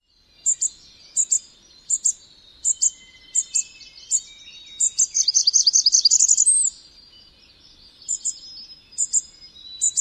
dawn song with chips

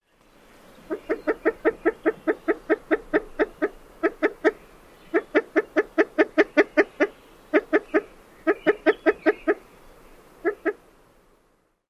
female alarm calls

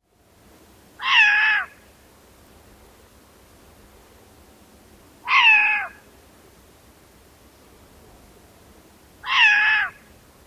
screams

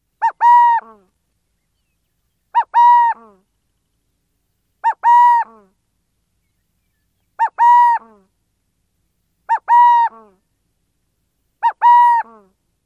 coo-coooo calls

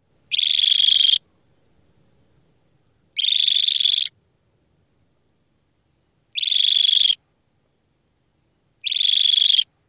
alarm calls

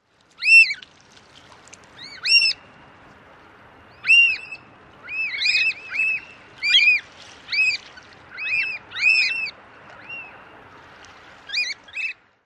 queep calls

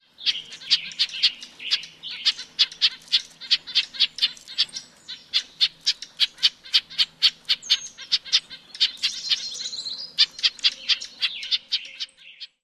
more calls

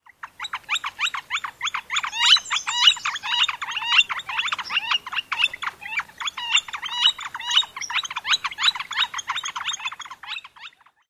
interaction calls yellow-shafted; NY

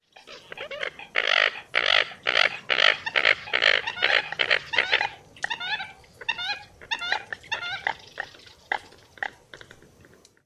call variant num3

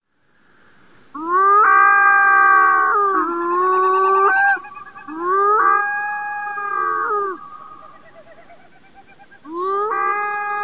wails; AK

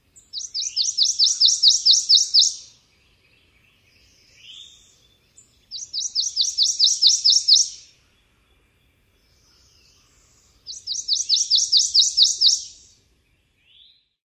songs

